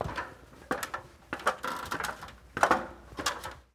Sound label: ladder; anemoi